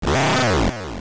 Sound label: Boss Hit; A boss hit; Nightmares